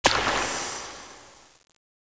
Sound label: DOUSE; PC Gamer IT CD 38; thief